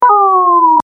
player death

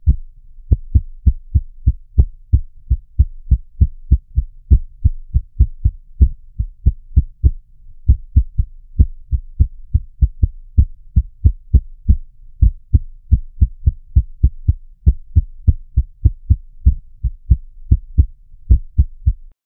intro soundtrack for an a, advertising

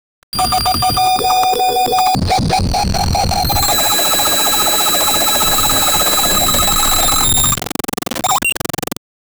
in slow motion the final, robotic

